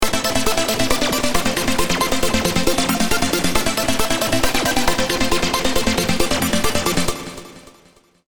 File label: space dust arp 136bpm; vitality vol 10